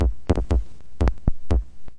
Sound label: tiny evil space robot, drum loops and breaks, 120 bpm